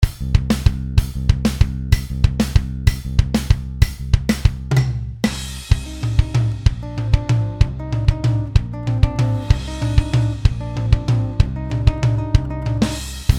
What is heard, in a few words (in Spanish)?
bateria